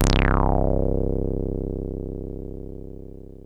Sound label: 303 C1, TB 303